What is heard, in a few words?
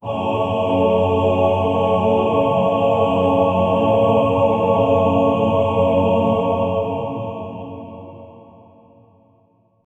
dark horror choir chord